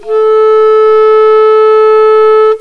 clarinet

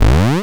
big jump; spelunky 1 1

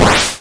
splash; spelunky 1 1

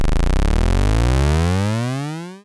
bow pull; spelunky 1 1

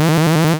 bomb ready; spelunky 1 1

